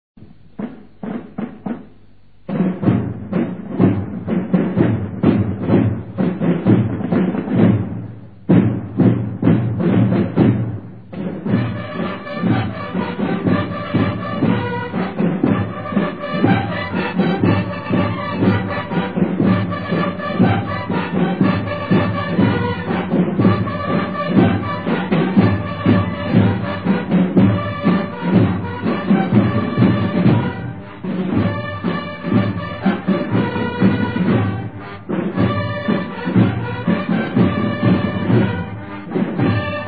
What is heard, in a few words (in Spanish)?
Marcha, TOQUES DE CORNETA; Rgto.PAVIA 4